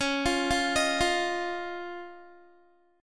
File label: Db Major Add 9th, Guitar; Dbadd9; arpeggiated; tuning=4F,4A,5C,5G,5C,6E; 20,20,17,20,17,x